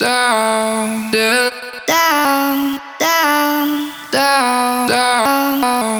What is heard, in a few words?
Vocal Chop 160 BPM F# Min; Cymatics; Euphoria Vocal Sample Pack